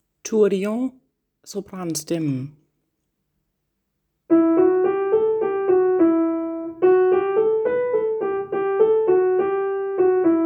sopran; harlev koret; Tourdion

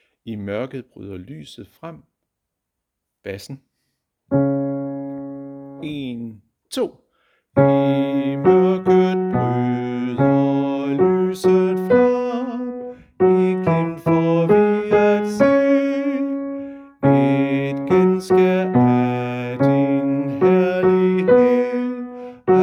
bas; harlev koret

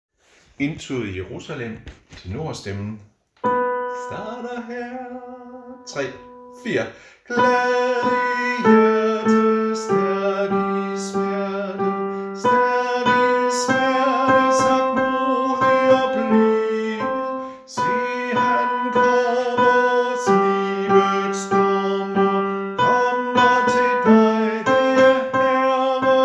Indtoget i Jerusalem tenor

